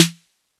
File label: snare mid snap trapped, Classic Chicago House Drum Hits